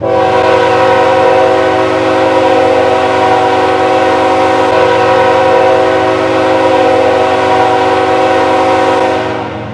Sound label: k5hll, horn; run 8 v3 horn pack; csx